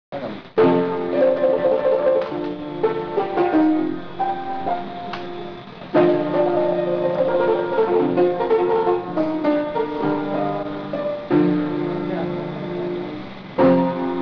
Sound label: piano